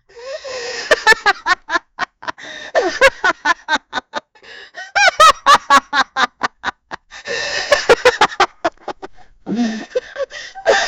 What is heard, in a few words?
the old aunts laughter is